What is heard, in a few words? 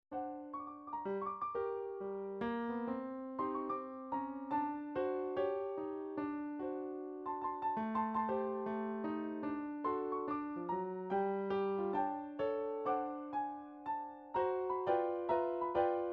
st louis piano, midi